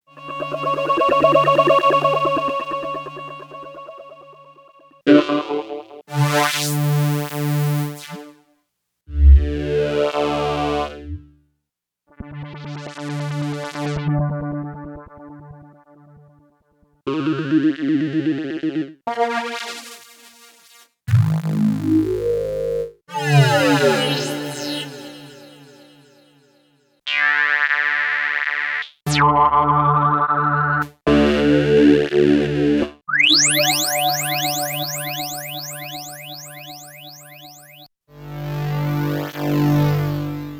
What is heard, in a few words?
sine rise